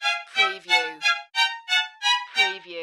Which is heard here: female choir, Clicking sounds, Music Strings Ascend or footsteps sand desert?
Music Strings Ascend